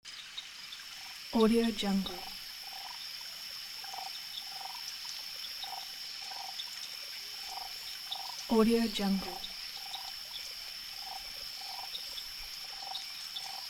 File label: swamp at night